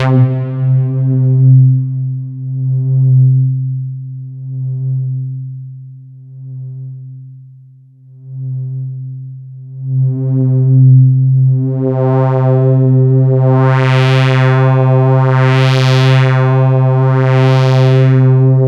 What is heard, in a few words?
Roland JV 2080, interval; MG INTERVAL